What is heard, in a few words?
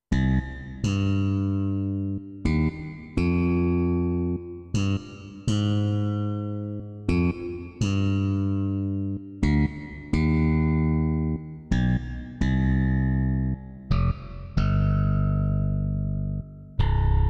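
Slap Bass, digital piano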